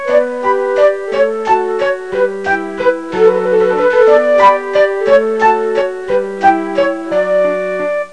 pan flute

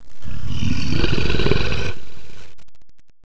groan; Notrium

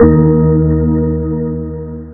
Swap Sound; Realmz

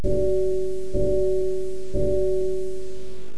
chime lo

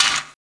mtl db, debris metal; SiN

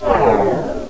zoom out; duke nukem total meltdown

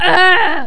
pain, player; QUAKE2; female

